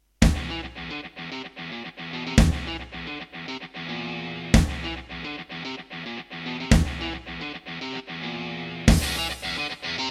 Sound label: Yamaha MU2000EX XGMODE; Doom